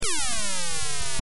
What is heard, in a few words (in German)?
musik